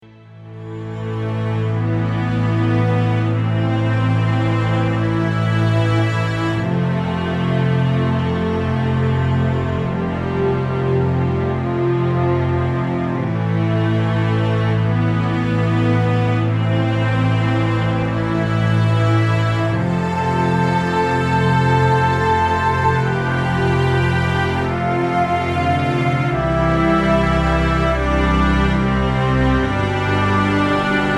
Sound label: 12 String Outro